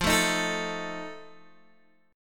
F 7th F down Guitar Open D

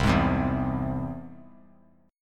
C Augmented down; strummed; JGuitar

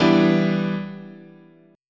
E Minor 7th

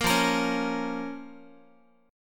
F Suspended 4th Sharp 5th, down, Guitar; strummed; 13,13,13,11,x,x; JGuitar

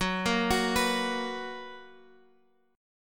F sharp Major Add 11th; F#add11 arpeggiated

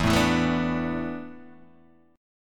E 7th Suspended 4th, Guitar, DADGAD

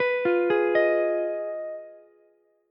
B 6th Flat 5th B down Ukulele; arpeggiated

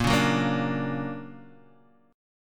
D Augmented Major 7th Bb, guitar

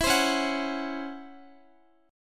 C sharp Minor Major 11th C sharp Guitar; C#mM11 strummed; JGuitar

